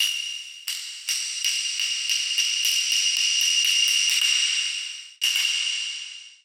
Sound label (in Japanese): hyoushigi